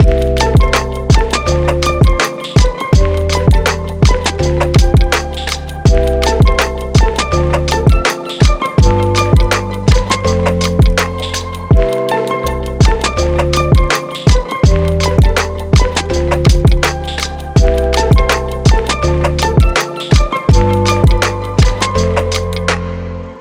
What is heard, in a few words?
lofi meditation